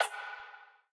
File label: Change Music; Game rip; MiSide